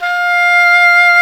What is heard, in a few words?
WND ENG HR, English Horn; Roland L-CDX-03 Disk 1